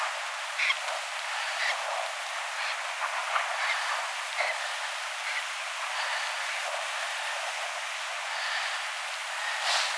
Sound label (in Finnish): kurpan lentoonlahtoaantely